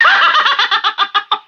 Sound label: vox laugh big